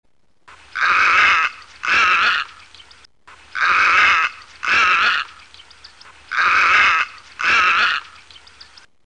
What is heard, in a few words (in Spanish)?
garceta comun